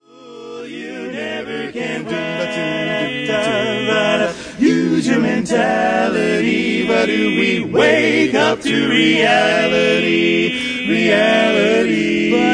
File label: barbershop quartet